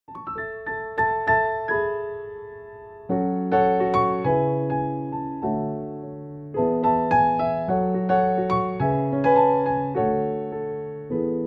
Piano Version

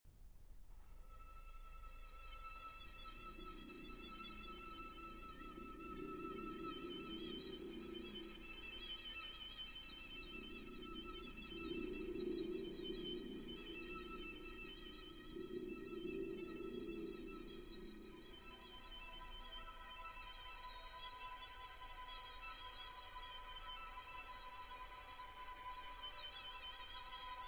Violin & Orchestra

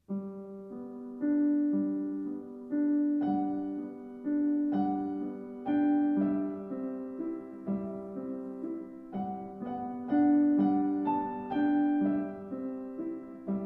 Piano Duet, Quite Slow and Thoughtful; 4 Pictures For Piano Duet No. 2

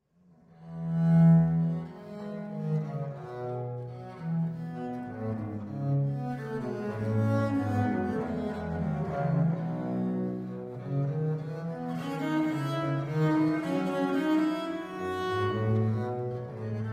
Ayre For Two Bass Viols

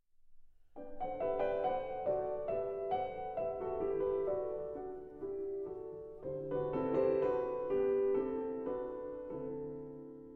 Piano Trio in G Major, Andante con moto allegro; Debussy

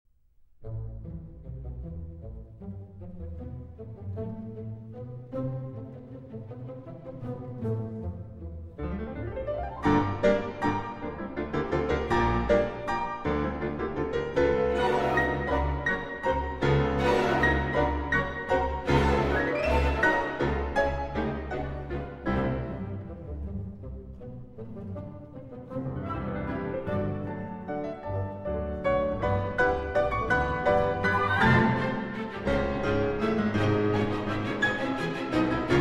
Piano Concerto in C Major, Allegro ma Non Troppo; Prokofiev; Piano Concerto No. 3; Op. 26 III.

